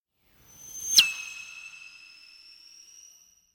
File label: Space Ship By - High Toned Engine, Very Fast; Hollywood Edge; sound designer's toolkit v2